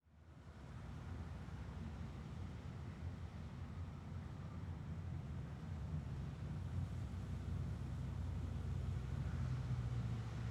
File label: Ambience Road After Rain From Van Window Interior; AdobeCC